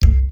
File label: menu back